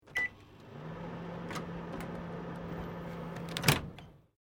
Microwave Sharp Start Stop Door Open, Microwave oven; Kitchen; Household